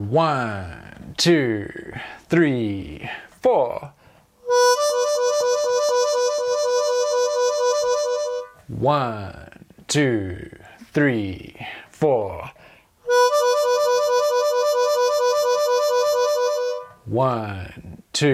12 Bar Blues Warble Practice Full Play No Backing Track, harmonica